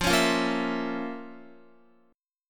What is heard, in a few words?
F Major 7th Suspended 4th Sharp 5th F, Guitar